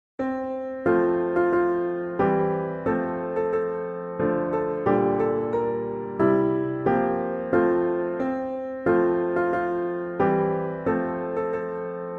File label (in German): Oh Tannenbaum instrumental